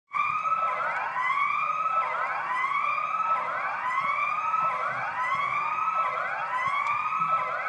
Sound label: alarm